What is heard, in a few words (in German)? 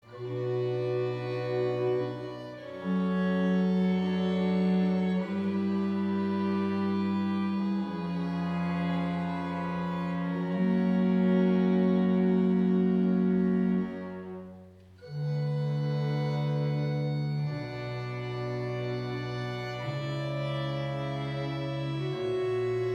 Adagio Concerto fuer Streicher in D Dur; Antonio Vivaldi; RV 121